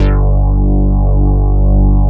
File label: SYNTH BASS, Bass Synthetics; E-MU Producer Series Vol. 2 – More Studio Essentials